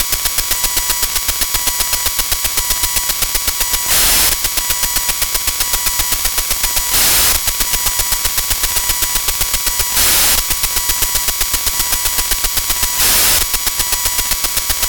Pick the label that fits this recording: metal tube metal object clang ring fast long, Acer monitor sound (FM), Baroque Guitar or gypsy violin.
Acer monitor sound (FM)